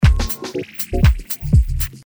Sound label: snare